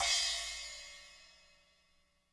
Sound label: splash, splashes